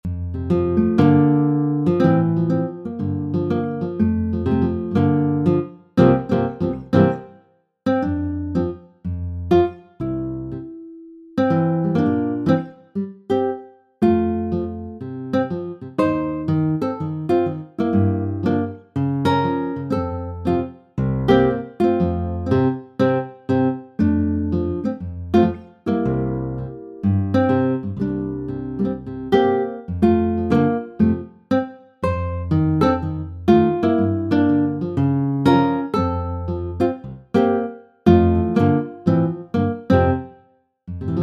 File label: happy birthday smooth guitar